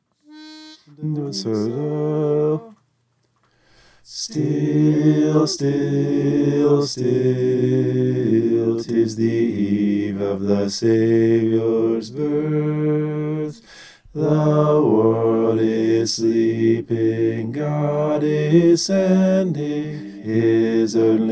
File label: bass